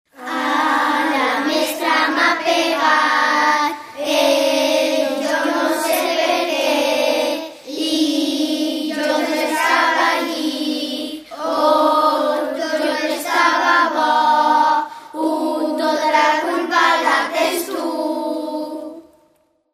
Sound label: Vocals, tradicional